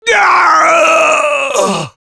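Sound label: pain critical death, French; Hurlement d'agonie 3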